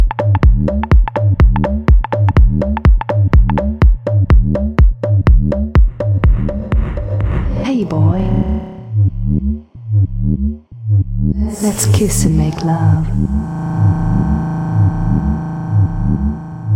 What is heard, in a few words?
minimal